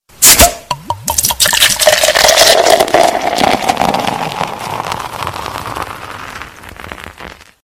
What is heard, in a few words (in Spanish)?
destapar y servir cerveza